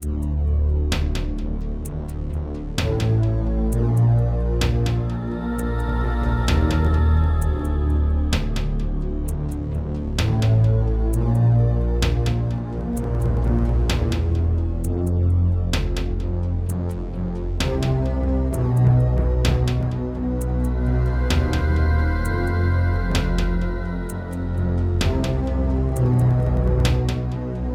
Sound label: Yamaha MU5; Descent II